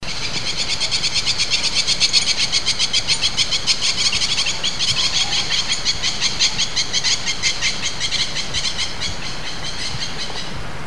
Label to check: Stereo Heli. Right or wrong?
Wrong